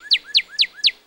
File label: Cardinal Call Chirp